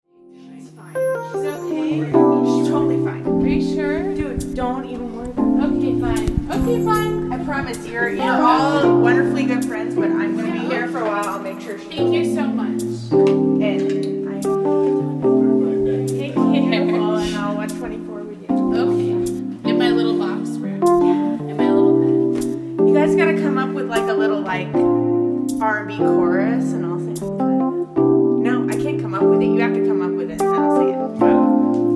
Rehearsal

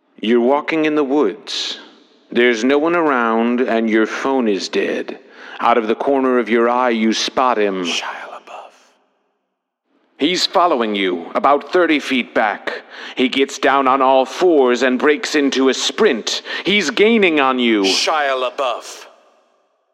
Vocals Only

